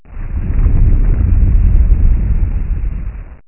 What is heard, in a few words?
Quake; lands